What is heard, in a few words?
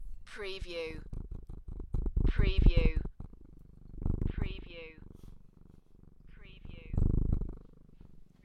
Cat purr; beanstalk audio